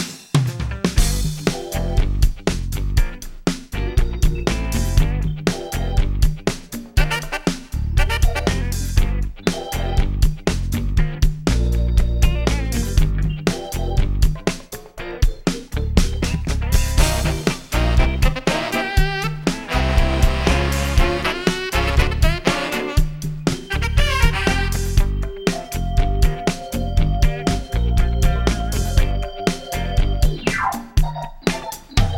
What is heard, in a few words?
groove